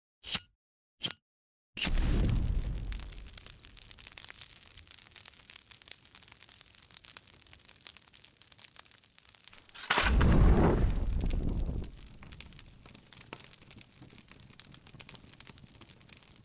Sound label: Sparking Fire Variation; audiopostproduction